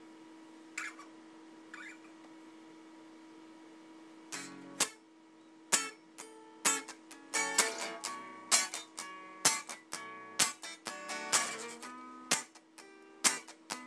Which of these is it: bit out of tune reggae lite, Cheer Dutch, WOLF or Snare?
bit out of tune reggae lite